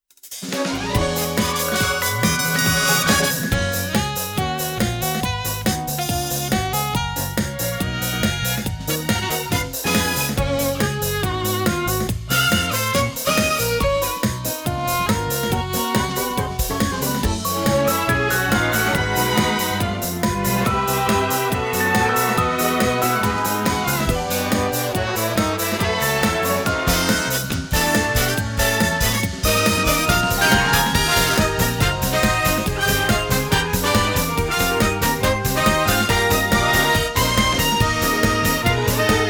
Final Lap, Frontrunning; Squeaky Clean Sprint

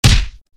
Charge tackle over

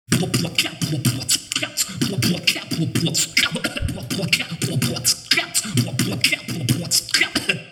beatbox 16 beats

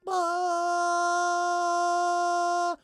AMB China 3D Amb

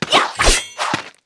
Knight F attack

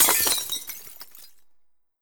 Skel Scientist Flask

